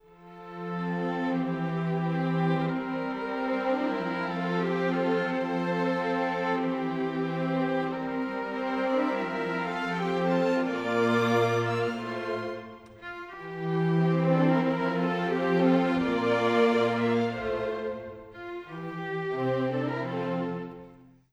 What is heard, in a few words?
Classical Strings, orchestral; orchestral 1